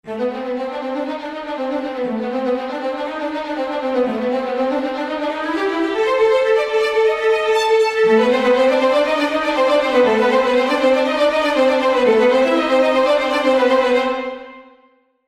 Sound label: action perp mobile